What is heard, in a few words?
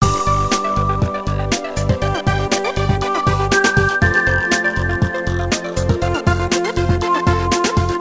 Music Calm; Learn to Fly 3